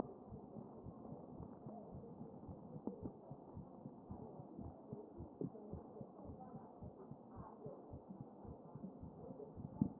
A CHEST

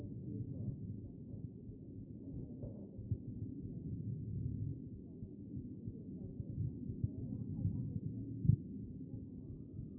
chest; B MODE

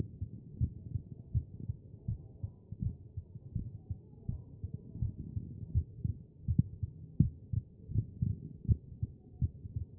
A CHEST; B MODE

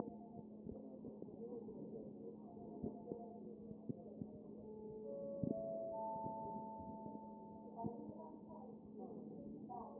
CHEST pos2 D MODE

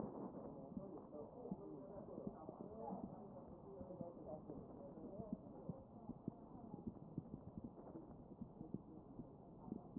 chest

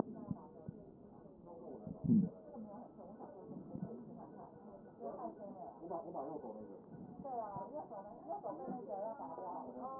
CHEST

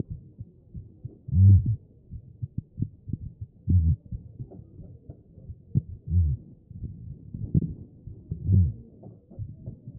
CHEST; B MODE

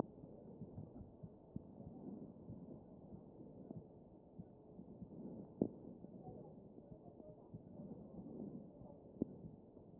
CHEST pos1 D MODE

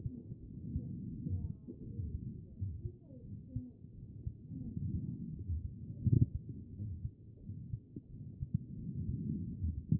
chest; B MODE

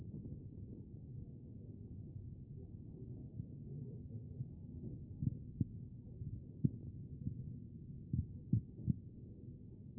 CHEST pos4 B MODE

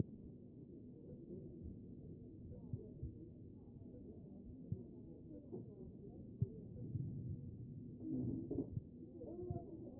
A CHEST; B MODE

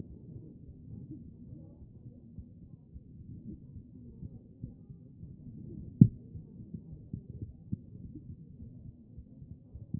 chest; B MODE

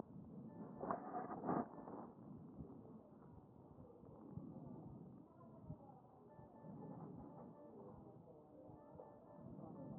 chest

